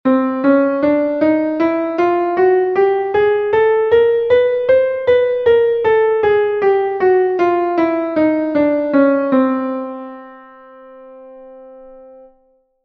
escala 12 notas

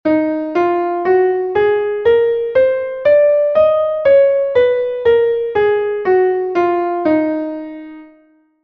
re sostenido menor melodica

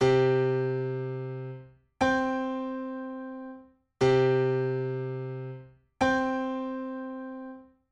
intervalo armonico docena justa

